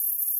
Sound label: SYST HP C#5; Analogon